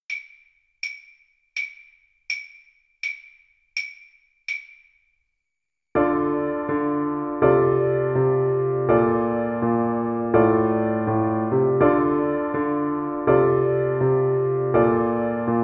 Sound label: blues Flute